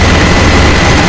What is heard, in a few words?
minigun shoot; drones; garrysmod